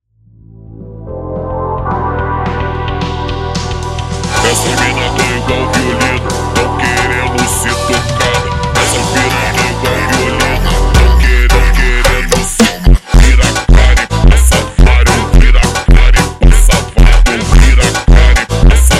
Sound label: Funk super Slowed